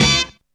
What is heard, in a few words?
brass stab